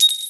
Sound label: medal; super trench attack 2